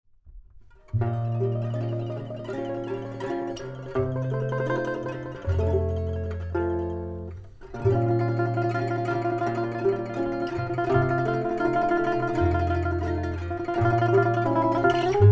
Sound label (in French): saz douce ambiance; Turkish Saz / Baglama; Only one way to find out! Does the out-of-tuneness jar or does it sound like typical Middle Eastern music